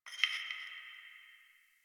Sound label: Interior Distant Marble Hit; Warframe; Ambience; Nineteen Ninety Nine